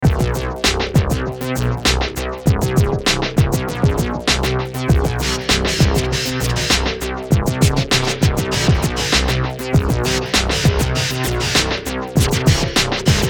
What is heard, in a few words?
bounging skratch